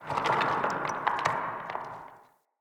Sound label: Cave Rock; Caves; Glades Of Andurin